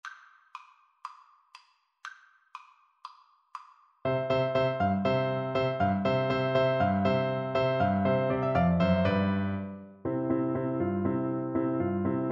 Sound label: autumn 1st mvt, Recorder